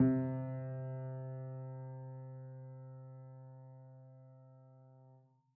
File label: piano sampler